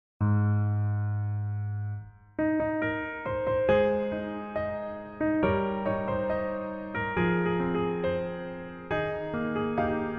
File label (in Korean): Piano 멜로디MR 남자키